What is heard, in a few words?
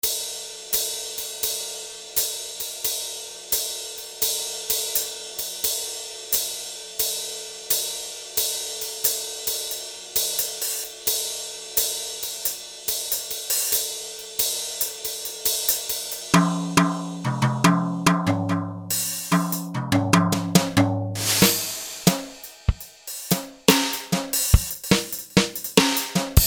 Brushes; Roland L-CDX-01 Rhythm Section Instruments